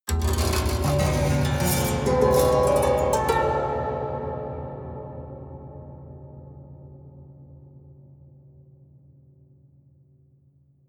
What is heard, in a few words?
haunted strings up stroke slow hard